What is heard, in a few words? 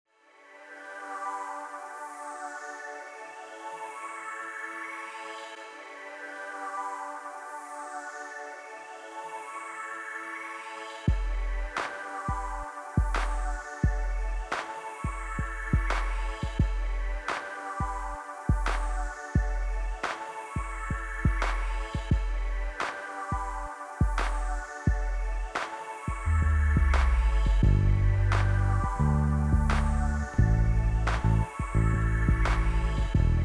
pianobar